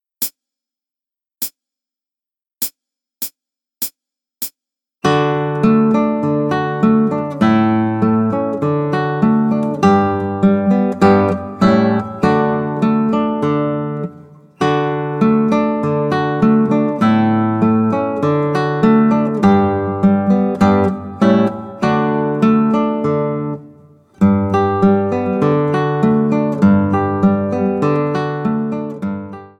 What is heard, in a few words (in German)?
Begleitung, Gitarre; Play Guitar Gitarrenschule 2